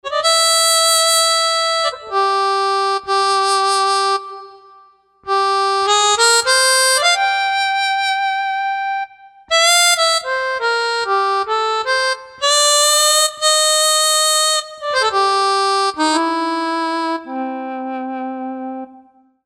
Harmonica

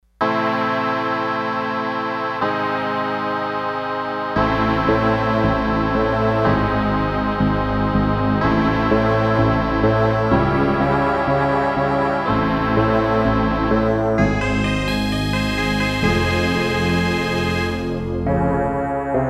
Nightmare, Roland D-10; Factory Patches

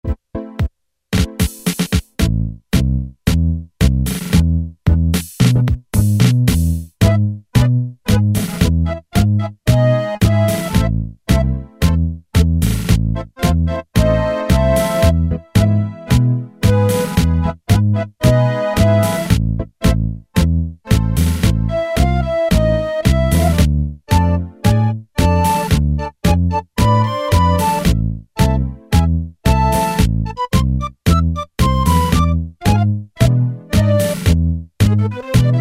tango, Technics SX-K450 Automatic Rhythms

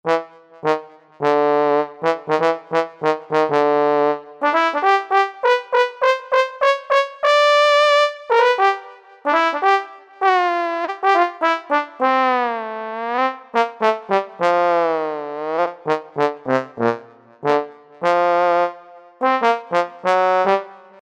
Breathy TBone, E-mu Proteus VX

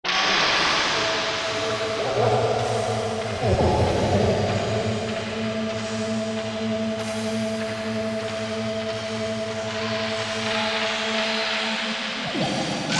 Dark Side, Korg MSC-14S Sound Effects

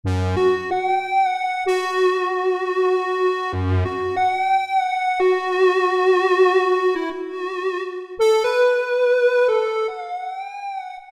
Cubic Lead, Roland SR-JV80-01 Pop

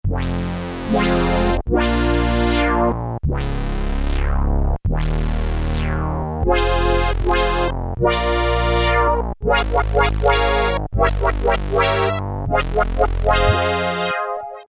Synthe Brass, Korg Poly-61M

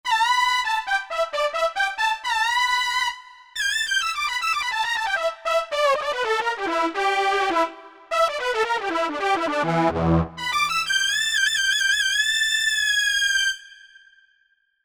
Solo Synth, Korg MSC-1S; Korg MSC-1S Variety

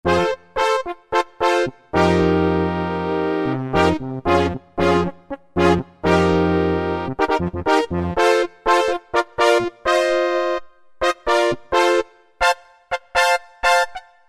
Brass, Roland D-110; Factory Patches

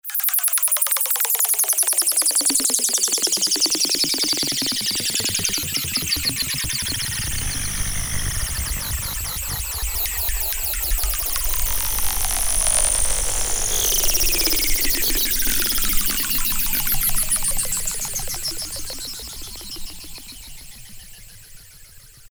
Sweep, Roland JP-8000; Factory Performances; AKS Sweep

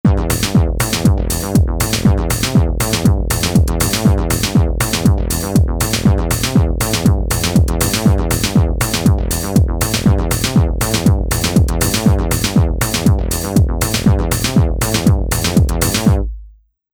Techno

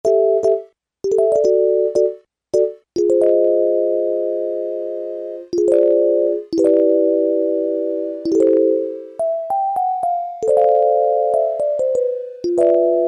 ELECTRIC PIANO, Yamaha SHS-10

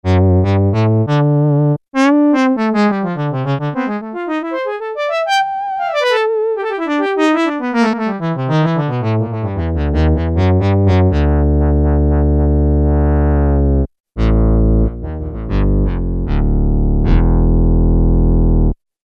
Slow Attack, Peavey DPM Spectrum Bass

